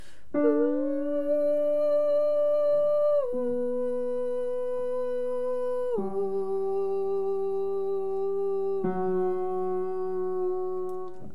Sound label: verse tenor oooh x4